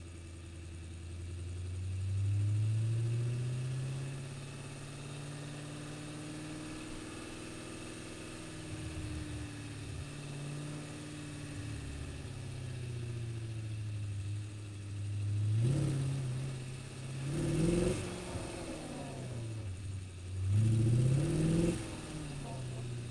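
audi rs4 onboard circles drive in circles stop engine center firewall; dpa4061; sonniss gdc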